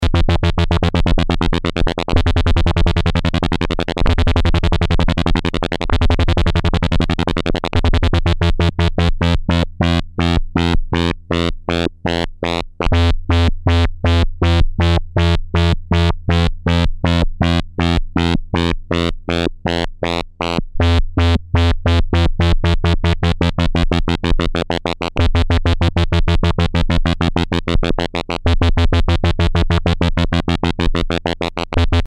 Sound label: LFO